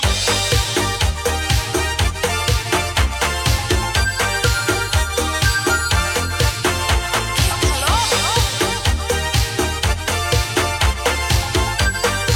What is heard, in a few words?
Instrumental